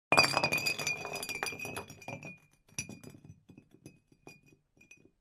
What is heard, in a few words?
bottle kick; Pitch Black - A Detective's Demise